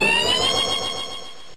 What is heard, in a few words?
Hydro Cannon